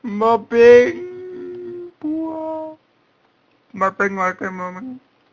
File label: my babbeee wahhh nothin, male singing